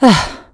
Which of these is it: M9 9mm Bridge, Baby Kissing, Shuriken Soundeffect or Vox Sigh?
Vox Sigh